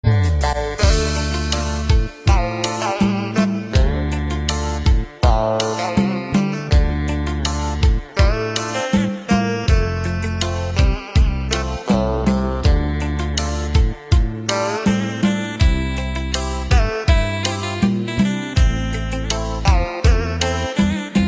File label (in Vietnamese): Hòa Tấu Rumba Không Lời Guitar Ngọt Ngào